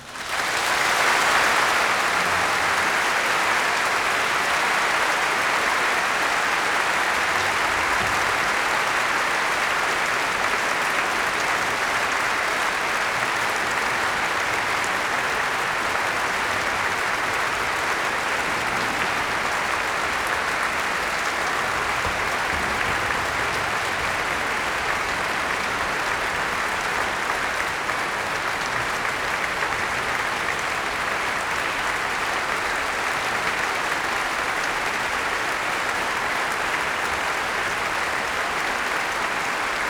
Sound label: Applause